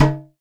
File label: headed, malaysian dr; AKAI S-Series CD-ROM Sound Library VOL-5